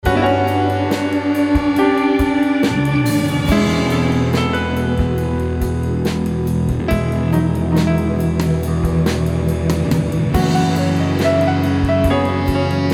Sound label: Feedback Soul overdrive